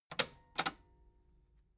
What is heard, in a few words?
metal button cassette stop slow